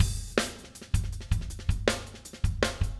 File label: 160 Nustyle Drums Verse, Very Fast Tempo; Pop Rock; Pro Tools Essential Loops; Digidesign